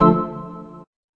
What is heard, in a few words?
M1 organ hi; Organs